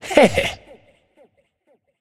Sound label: hehheh; smite; tiamat